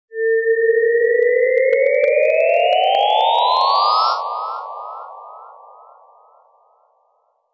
jumpscare; mars

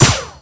crit hit mini; Garry's Mod; tf2

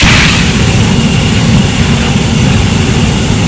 flame thrower start; tf2

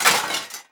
item metal scrap drop

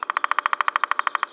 peckr, forest; ambient; Garry's Mod

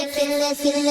skibidi loop; skibidi toilets toilet team requeriments; skibidibop series

